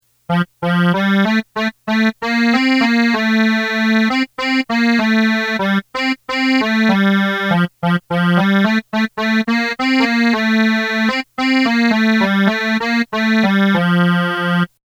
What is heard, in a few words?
melody